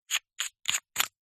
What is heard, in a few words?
household nail polish lid unscrew